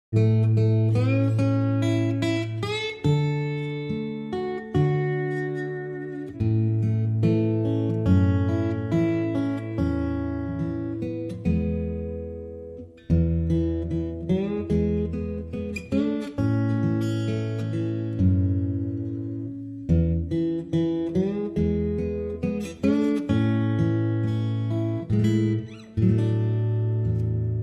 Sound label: Folk Ballad; Folk Ballad 1